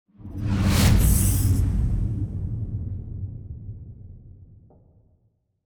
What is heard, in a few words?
cruiser move; aquatics